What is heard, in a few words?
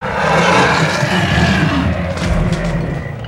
dragon roar